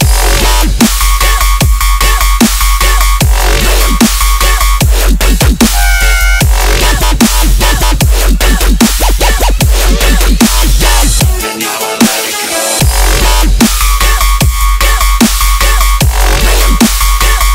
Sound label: Drop, wub wub wub; TF2Maps